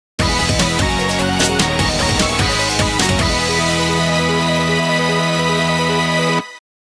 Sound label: Winner's Fanfare; Touhou Genso Rondo： Bullet Ballet